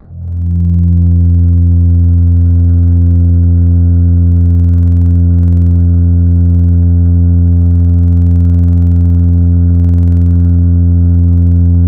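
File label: world Flute, Wavetables; Synthetic's Serum Collection; Standalone-Music SYMPHONY Wavetables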